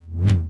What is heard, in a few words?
pike swing; weapons